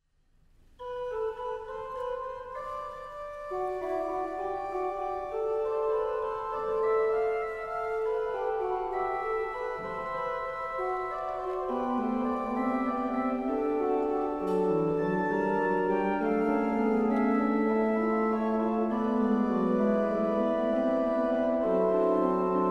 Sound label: Kyrie Gott heilger Geist, orgel; J.S.Bach; BWV 674